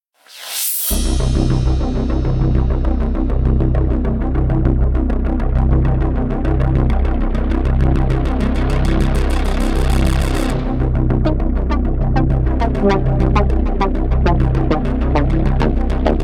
menu soundtrack; tanks of freedom

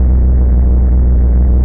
engine; stunt rally

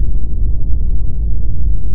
electric, engines; stunt rally

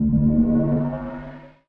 hyperdrive out; endless sky

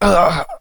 pain

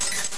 rupee counter end; zsdx

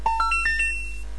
chest appears; zsdx

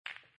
quiet ball; agent 8 ball

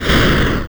explosion; tanks of freedom

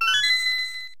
picked small key; zsdx

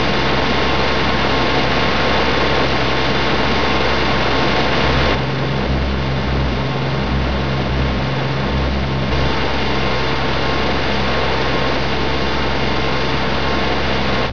crane; race into space

